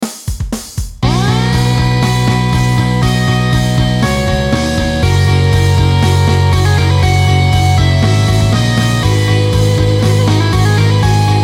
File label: Riff, rock